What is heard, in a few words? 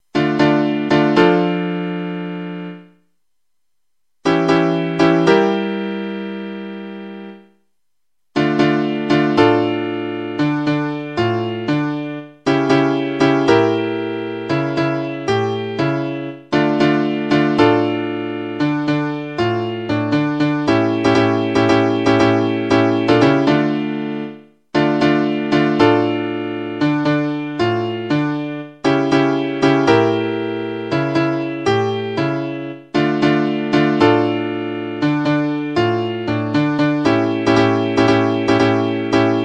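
piano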